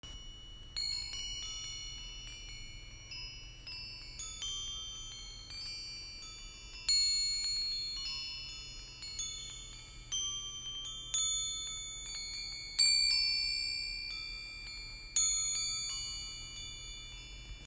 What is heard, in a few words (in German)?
Klangspiel Bernstein